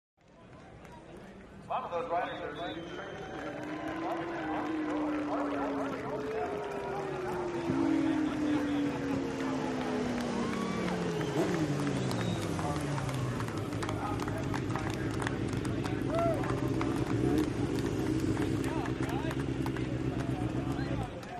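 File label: Motorcycle Finish Amb, road racing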